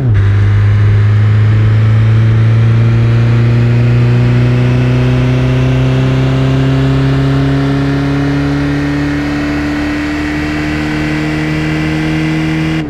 third, vehicles cars; lwcars

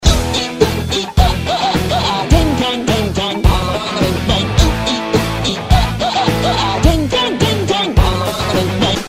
taunt music; actmod; quagmire

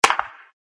sond clap; actmod

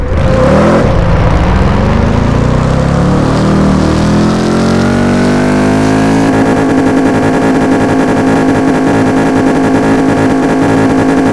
rev, vehicles cars; lwcars

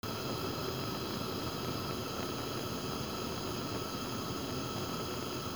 flame loop; blues pharm

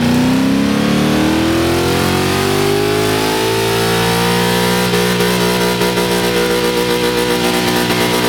rev, vehicles cars; lwcars

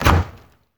freezer open; blues pharm

